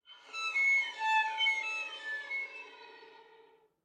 Violin Slide Down

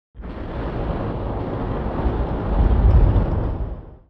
Flamethrower Distant; SoundsCrate

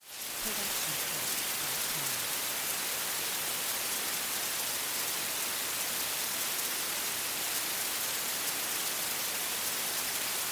rain; soundscrate

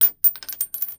Gun Shell Drop; soundscrate

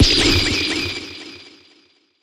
Anime Punch Synth Swirl

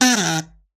kazoo short fall; soundscrate

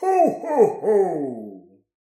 ho ho ho; soundscrate

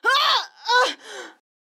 woman pained groan

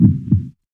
heartbeat double; soundscrate

